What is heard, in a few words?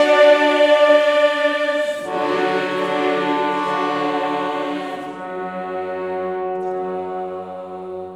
Chorus